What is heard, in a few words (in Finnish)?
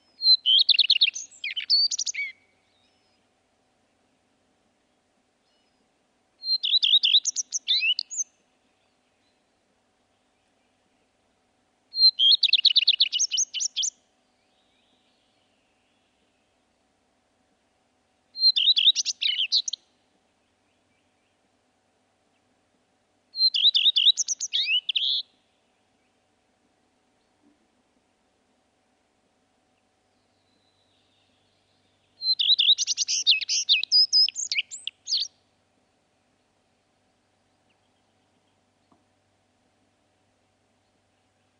leppalintu; Lyhyt, kirkas säe, jota voisi kuvata ”tii-ryi-ryi”; birdlife